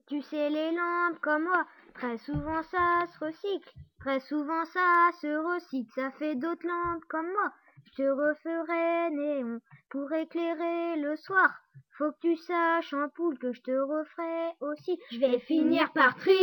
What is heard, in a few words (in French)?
chanson ampoule